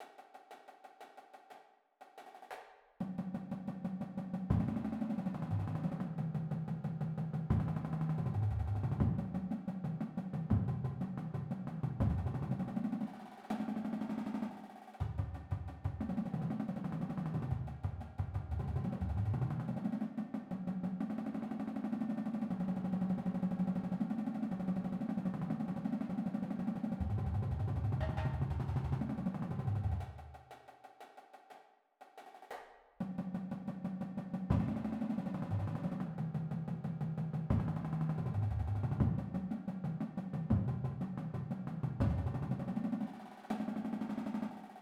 Paradiddlediddle rolls 120

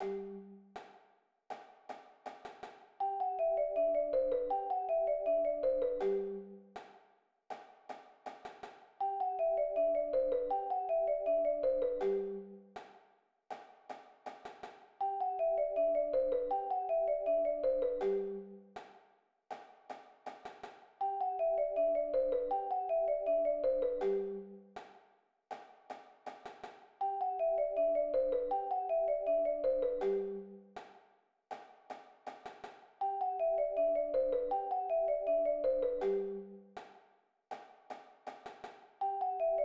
Stick Control Play Along 160bpm

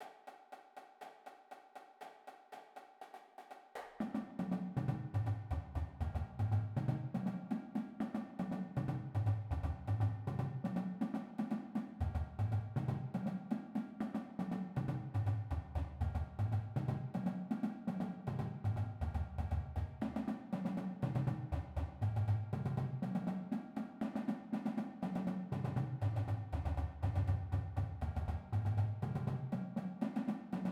double triple beat 120

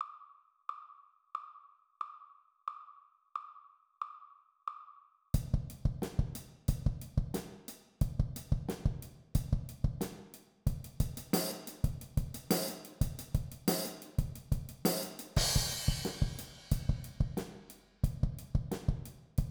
Mixed meter, drum set

